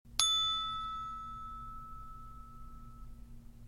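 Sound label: dew sounds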